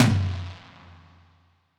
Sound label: Tom Smack Lo Verb